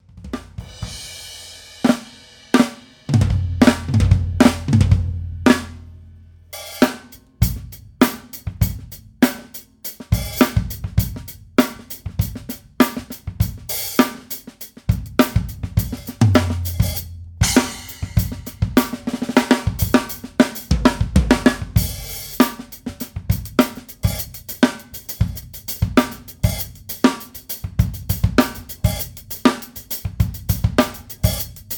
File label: overheads